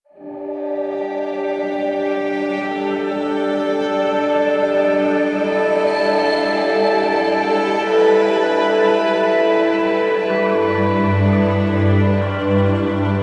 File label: Underwater Swimming; assassins creed origins unofficial soundtrack ps4 windows xbox one gamerip 2017